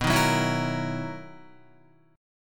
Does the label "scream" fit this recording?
No